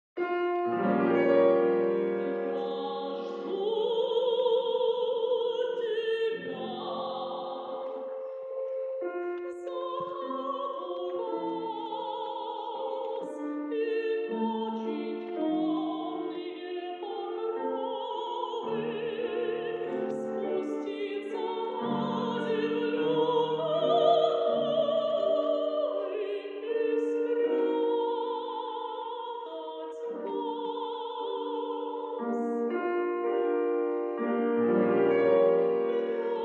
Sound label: duet